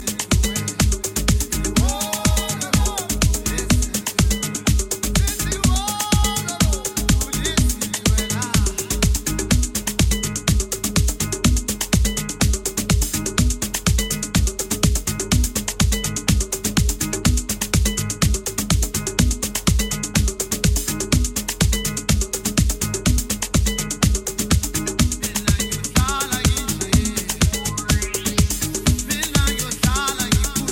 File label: Afro Voc